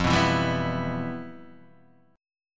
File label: G 6th, F, chord; G6/F strummed